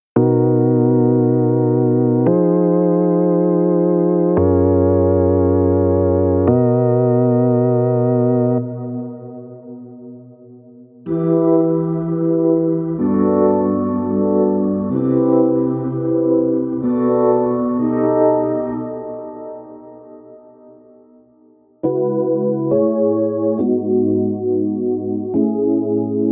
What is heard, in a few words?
Chords RB